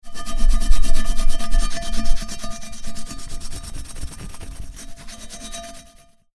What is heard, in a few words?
pot cleaning